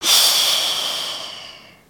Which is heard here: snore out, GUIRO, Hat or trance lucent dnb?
snore out